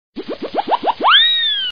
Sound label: Cartoon Whistle